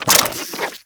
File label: Infested Vomit Gun Reload; Warframe